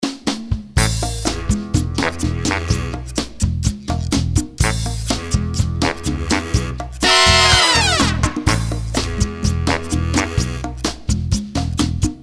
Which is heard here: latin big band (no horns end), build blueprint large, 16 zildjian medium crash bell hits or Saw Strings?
latin big band (no horns end)